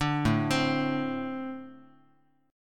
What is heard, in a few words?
A-Minor Double Flat 5th A Guitar